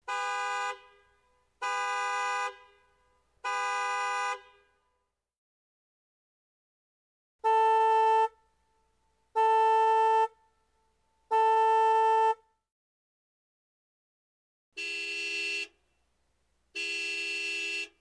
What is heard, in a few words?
Car Horn Medium Cu Var, american